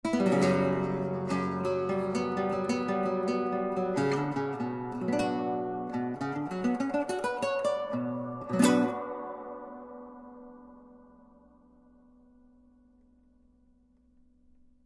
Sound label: With Clip On Reverb